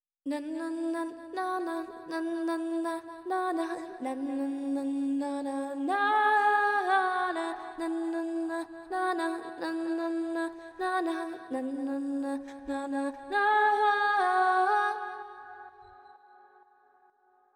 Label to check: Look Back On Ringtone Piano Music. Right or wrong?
Wrong